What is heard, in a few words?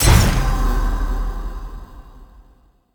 item holy water; Crypt of the NecroDancer